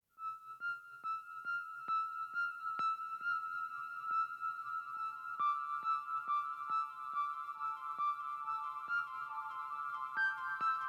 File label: Ambience Version; Nordic Drama Textures